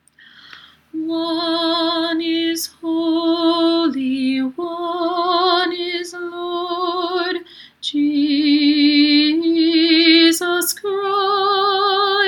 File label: Eis Agios English